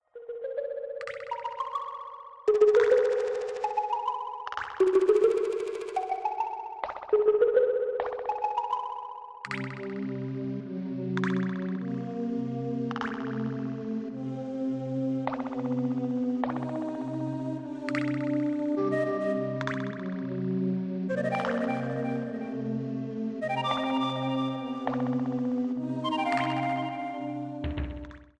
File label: Cave Dweller Concert; DKC; SNES